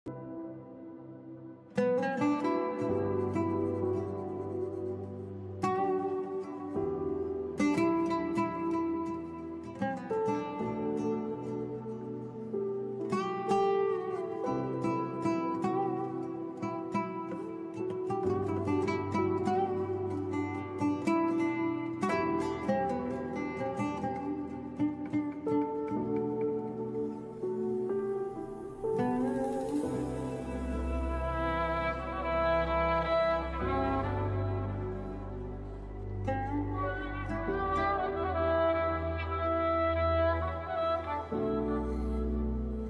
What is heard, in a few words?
Barbat in background; موسیقی بربط در بکگراند